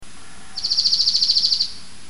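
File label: Dark-eyed Junco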